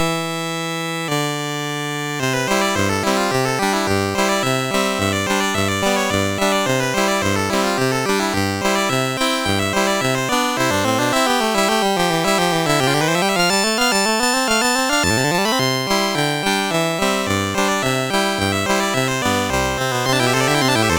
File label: Turkey, MOS6581; MOS6581R4